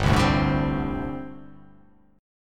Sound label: G Suspended 2nd Suspended 4th down; Gsus2sus4 strummed; JGuitar